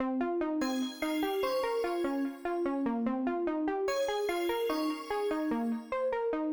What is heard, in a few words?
Bels Plucks